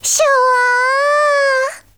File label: SE GAME SLIDE; maimai